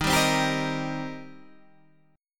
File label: Eb 6th Eb down Guitar Standard; Eb6 strummed; JGuitar